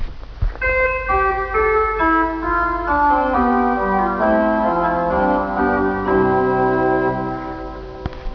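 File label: Manual 2, organ; Vitus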